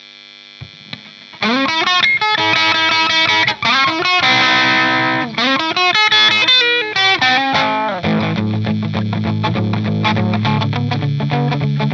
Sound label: rock melo; a la melody neck+bridge; Ma vision du G1; a la melody maker